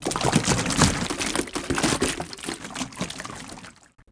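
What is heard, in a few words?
explosion debris liquid medium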